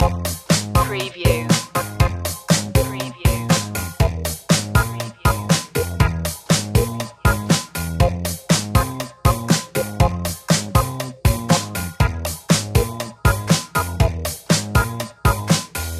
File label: electrowave loop